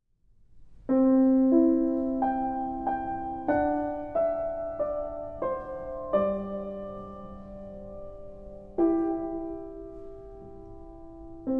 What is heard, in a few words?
Piano Solo